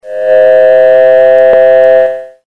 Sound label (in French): ALARME; Mono